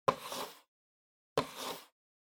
PC Mouse Move Wood Hit

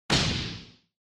Motion Fall Short

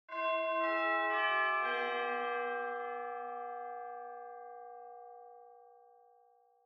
Japanese School Bell Far Fast Short

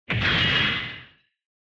Retro Anime Robot Low

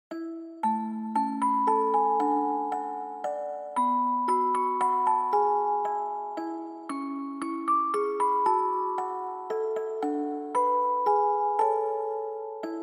Reverb Fast; bgm; We Wish You A Merry Christmas

